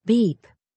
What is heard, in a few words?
beep